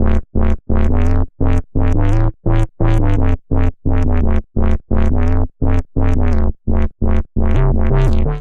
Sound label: Solid Steel Bass D Minor 114 bpm, Bassline; Tonal; MIDI Clips; Drive and Glow; Ableton; Factory Packs